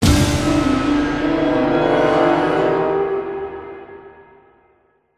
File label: Music Stingers - Battle Stinger; conan xbox 360 gamerip 2007